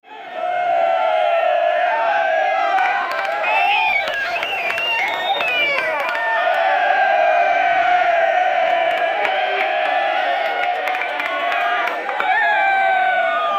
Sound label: a crowd at a baseball